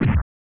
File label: Hit